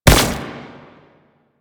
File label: Concrete Archgun Projectile Explode; Warframe; Archwing